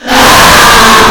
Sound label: Scream